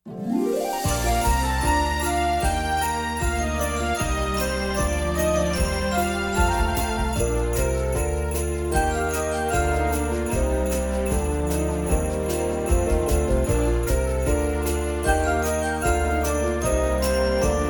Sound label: Hear the Bells Ring! (Accompaniment)